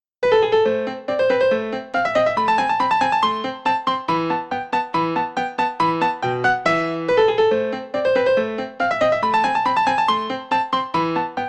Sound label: twisted turkish march